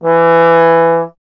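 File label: bass trombone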